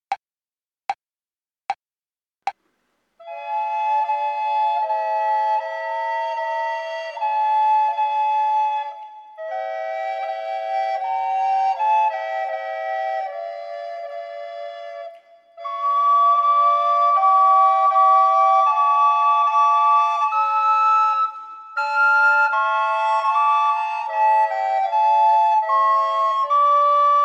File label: Trio triste